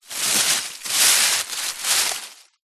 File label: foley tree branch small leaves short movement drag ground